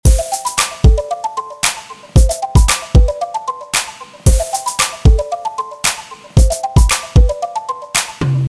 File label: dance magic; scratch game studio